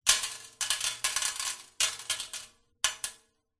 stones gravel small sprinkle handful into metal container; foley; zapsplat